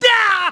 pain; vegeta

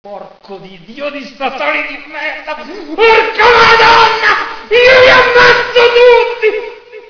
sing, tentacle

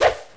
Swing

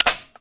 rpg empty

